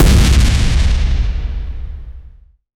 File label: epic anime style uppercut, punch